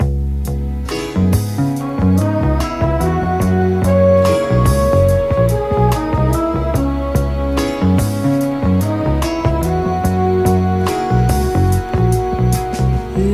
horns, Loops, Phonky

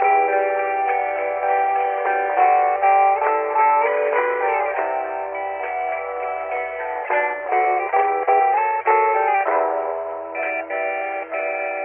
guitar busted looper, Jazzmatazz loops

